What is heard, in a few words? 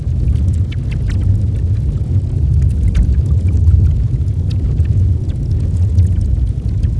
fire